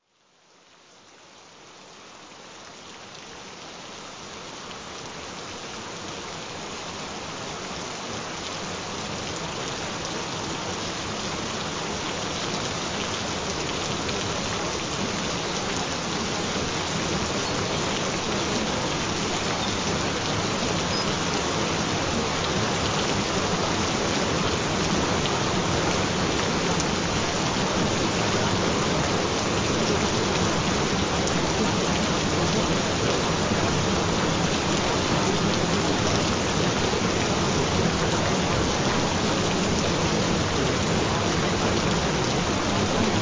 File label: Gentle Rain Sounds